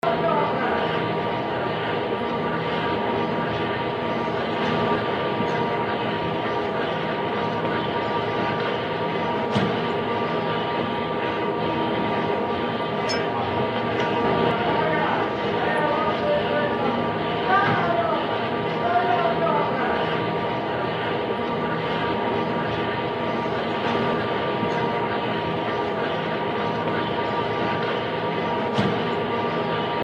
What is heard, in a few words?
atmo airport baggage turnstile